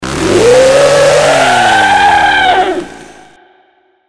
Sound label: saw alert; slender; cof